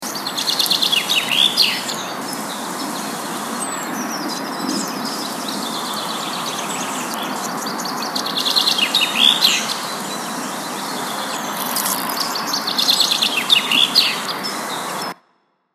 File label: birds singing sound